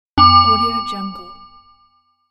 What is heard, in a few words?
light bell